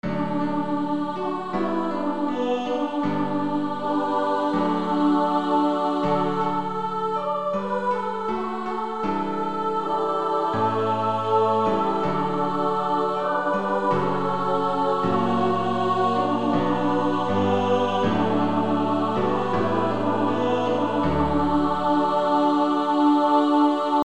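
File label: with Piano; Female Choir; SSAA 4-part; Somebodys Knocking at Your Door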